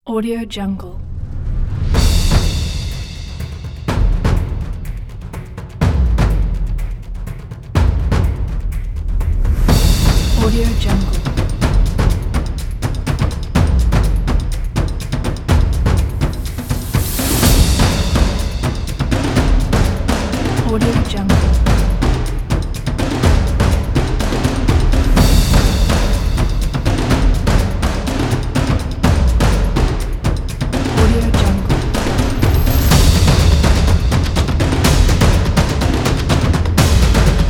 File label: drums cinematic